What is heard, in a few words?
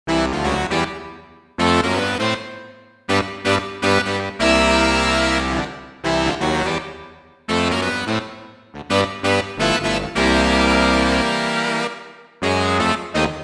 Sax Section, Roland JV-880; Factory Patches